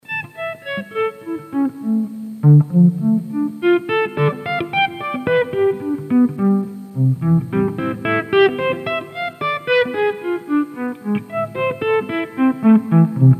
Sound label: 30 gain Dist violin; JFET